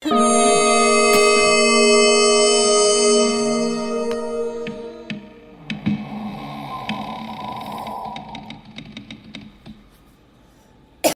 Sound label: Spooky Cinematic Stinger; Horror